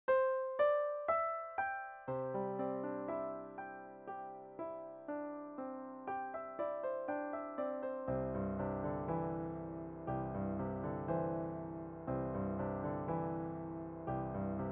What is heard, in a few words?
Pirouette duet